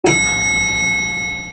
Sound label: ding